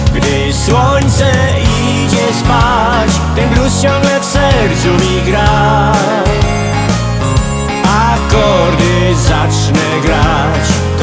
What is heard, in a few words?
blues